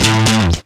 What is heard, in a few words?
Synths C; Vengeance Samples